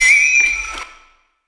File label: Perc